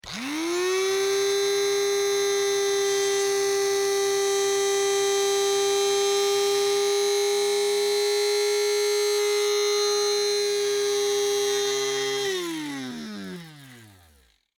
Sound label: Household Coffee Bean Grinder Motor Only Start Run Stop; SFX Bible 6